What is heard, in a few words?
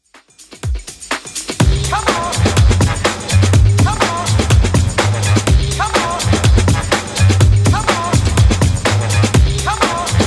90s Redrum 124bpm